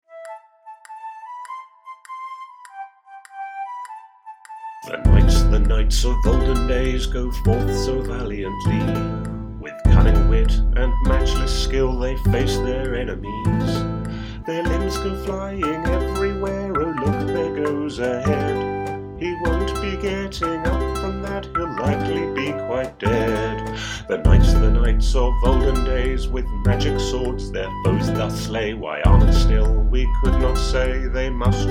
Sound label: Trailer Music; EKSoundTrack